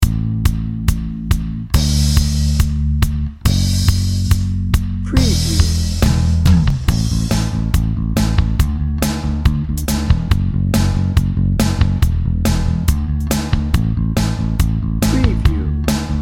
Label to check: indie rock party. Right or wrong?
Right